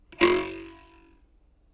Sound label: boing; bounce